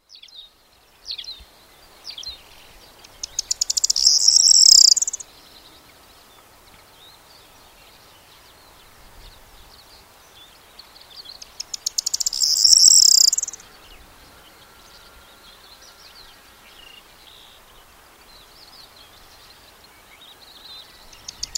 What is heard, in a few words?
corn bunting